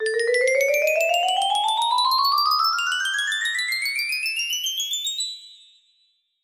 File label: music box, music box melody; 30 Note Music Box F Scale